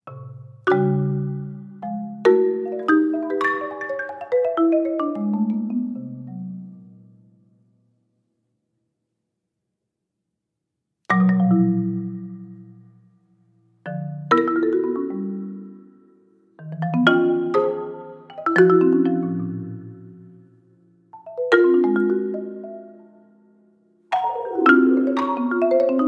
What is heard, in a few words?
marimba duo